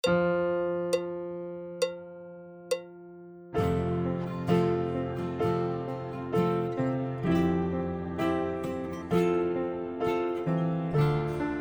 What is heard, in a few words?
Backing; Section G